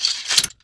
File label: item pickup